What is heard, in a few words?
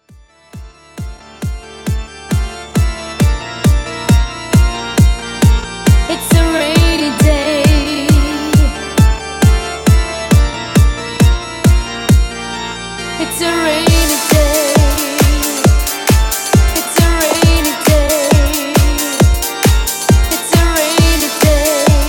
Dance Redrum 135Bpm